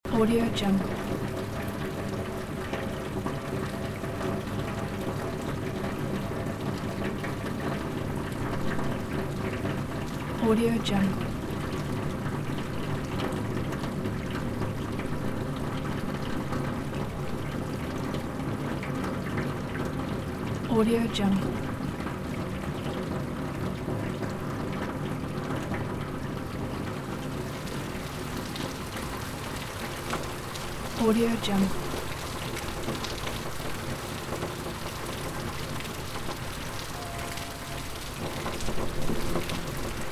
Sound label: heavy rain under leaking roof